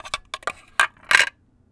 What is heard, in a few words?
Small Crushed Can - Clatter; Micro Pack